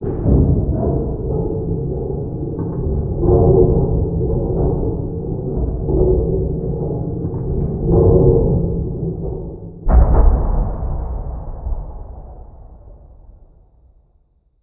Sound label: machine distant